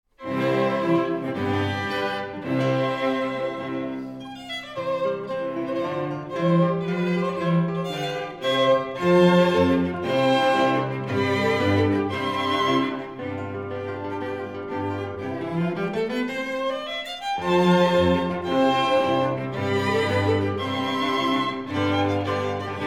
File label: Quintet C major allegro maestoso assai; Quintet No9